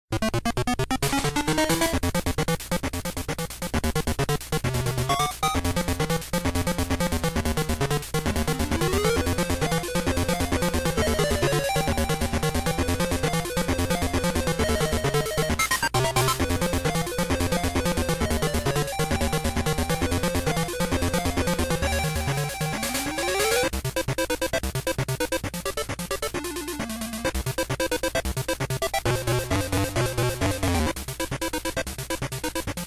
Bonus Dugtrio Pinball

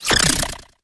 Grito; Sinistea

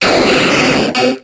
Grito; Kingler

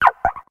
Grito; Tatsugiri lánguida

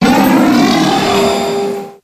Grito de Solgaleo

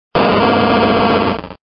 Grito; Ursaring

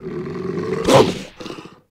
Grito de Maschiff

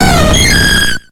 Grito; Espeon

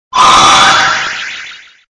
Grito; Dottler; Gritos de Pokémon de la octava generación

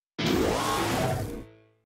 Grito de Toxtricity

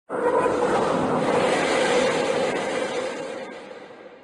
Grito de Melmetal Gigamax

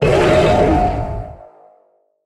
Grito

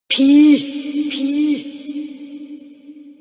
Grito de Pikachu Gigamax

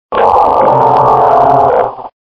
Grito; Wailord

